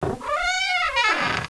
door ship

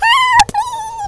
burn; hamster

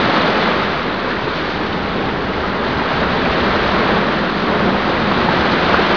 beach

